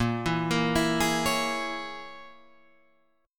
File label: A sharp Minor 7th Flat 5th A sharp, Guitar Half Step Down